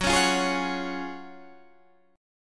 F sharp Major 7th Suspended 2nd, F sharp, down

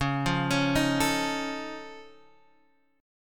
F Augmented 7th C sharp, chord

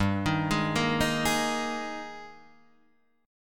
Ab Major 7th Suspended 2nd G, Guitar

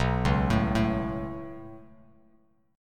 B 7th Suspended 2nd, Bass Standard; B7sus2; arpeggiated; JGuitar

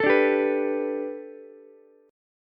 A 7th Suspended 2nd Suspended 4th Ukulele; A7sus2sus4 strummed

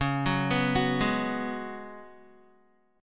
C sharp 7th Suspended 4th C sharp, Guitar; C#7sus4; arpeggiated; tuning=4F,4A,5C,5G,5C,6E; guitar chord

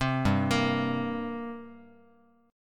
G Minor Double Flat 5th, G, Guitar; arpeggiated; JGuitar

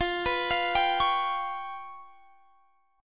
G 7th Flat 5th

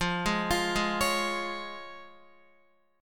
F Minor Sharp 5th, F, Guitar; x,8,8,10,8,9; Fm#5 arpeggiated; JGuitar

